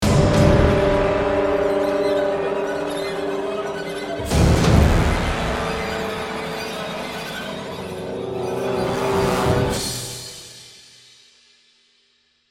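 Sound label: Music Trailer Crash Start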